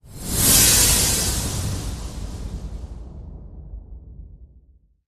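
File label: Whoosh Laser Jet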